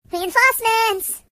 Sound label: Reinforcements, Singer; hedgewars